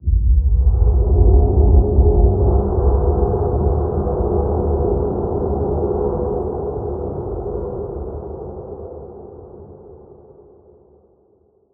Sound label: amb startup boomlight, ambient